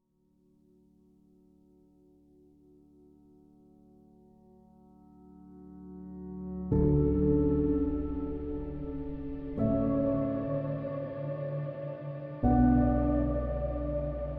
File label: Soft Piano Background Documentary